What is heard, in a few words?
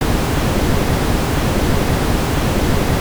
noise